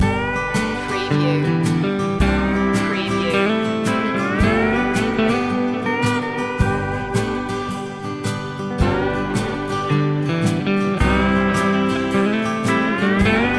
country walk loop

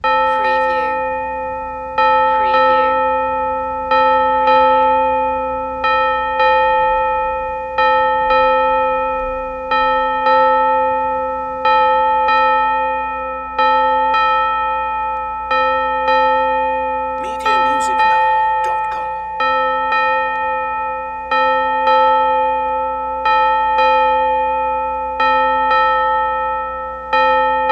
Church Bells Tolling

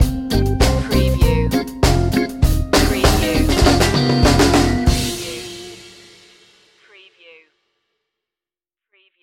reggae stinger

